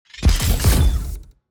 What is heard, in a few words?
Demon Mine; TANK; 3Souls